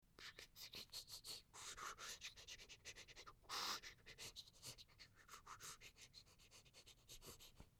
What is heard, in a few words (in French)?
frottage de main gel hydro; Liquide; Gel Hydroalcoolique; mouth-fishing